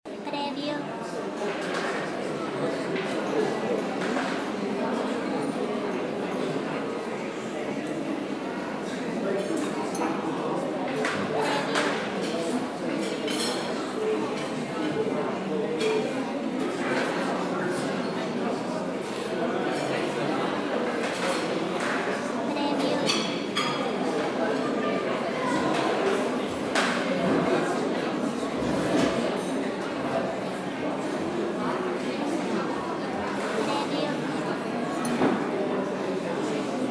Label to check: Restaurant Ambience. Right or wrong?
Right